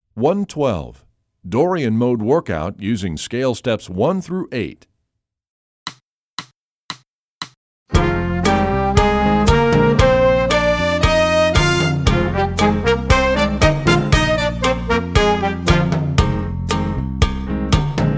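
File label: dorian mode workout scale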